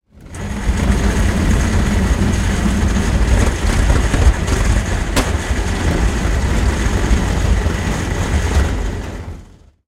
engine broken, tanks; War Thunder

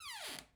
door small wood close start; Rust

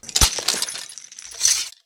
Inf Weapons; Red Orchestra 41-45

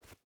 footstep hide dirt jump start; Rust

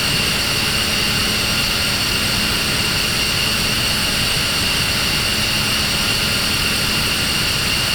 Aircrafts Engines Plane Around Engine; DCS

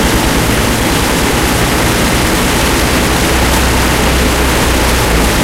stug40 engine int rpm load, tanks; engines; War Thunder

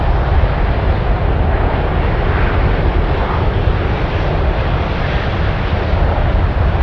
wind hi; War Thunder

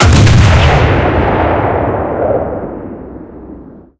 cannon 120mm qqf shot, cannons tanks; War Thunder

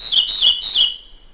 birds karelia; World of Tanks Blitz

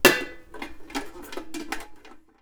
lantern strike; Rust

